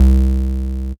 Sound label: C Bass; Electronisounds; Vibration Expedition One Shots